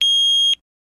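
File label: Clock Alarm Electric Be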